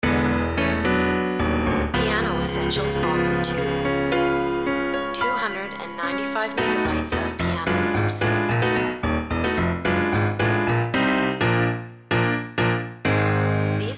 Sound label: piano essentials vo